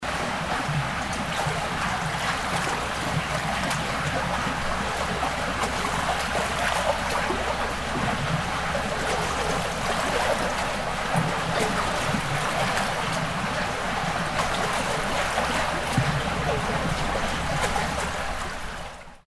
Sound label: drain waterflow